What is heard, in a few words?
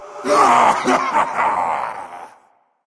laugh; Draco Knight; Tyr; Smite